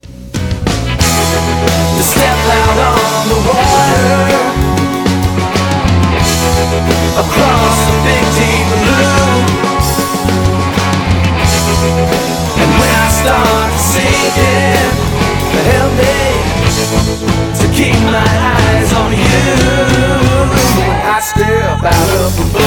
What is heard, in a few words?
chorus ringtone